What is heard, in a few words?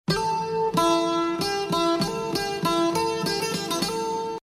guitar